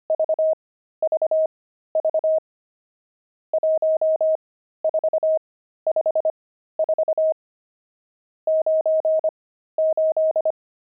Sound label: pangram, intermediate pangrams files